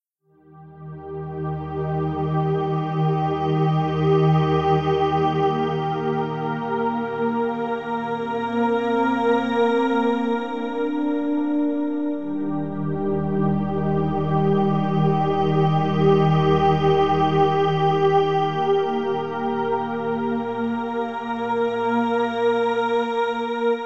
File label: Harmonic Wave